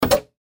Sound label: Tv Turn Off; Televisions; Electronics